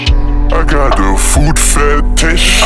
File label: uhhh shhhhh